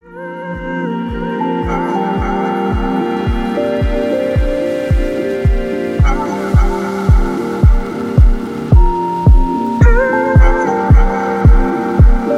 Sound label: Quickstep Night, house